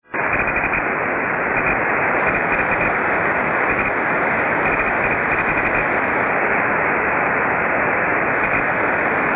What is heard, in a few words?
Wireless Keyboard and Mouse, Interference